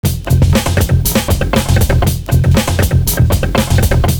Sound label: ROCKER BASS; Univers Sons - Kitch 70s